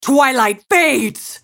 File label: spell cast effort; Diana